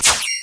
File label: SWITCH